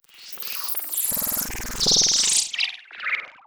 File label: Alien Chatter, sci-fi